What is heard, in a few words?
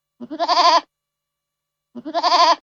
Goat